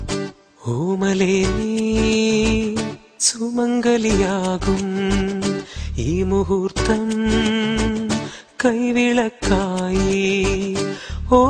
mounam malayalam

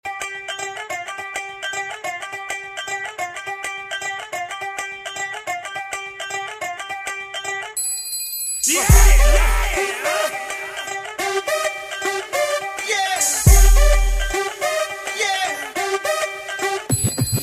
punjabi, hip hop ringtone

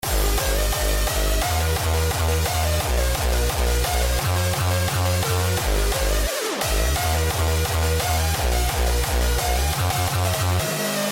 with drums

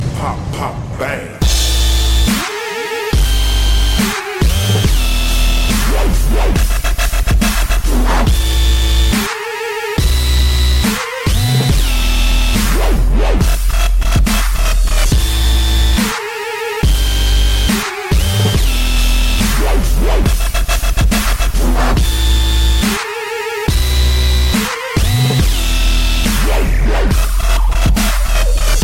Dream Dubstep

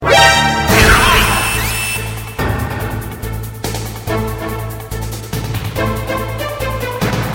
alarm full; ringtones; games; mgs

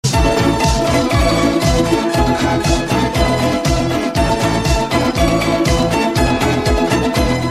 balalayka

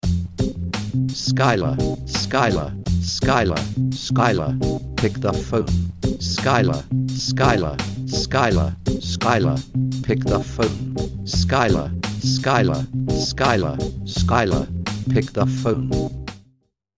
Ragtime

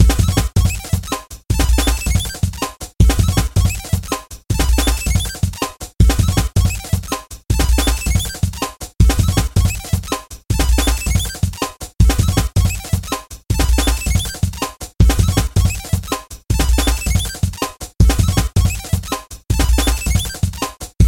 Beat

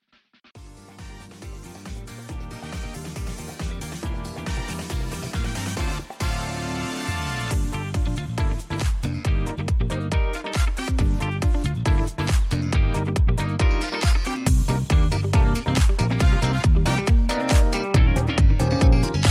Off Vocal

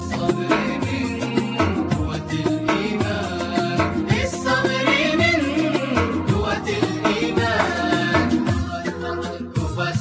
arabic, ringtones; alternative

